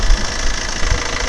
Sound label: opel corsa, Vehicles